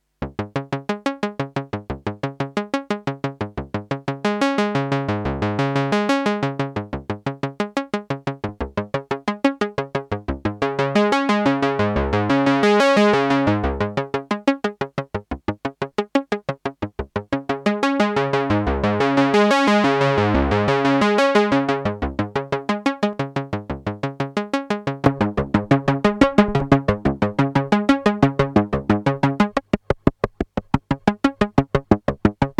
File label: Juno60 Mono Arpeggio; June60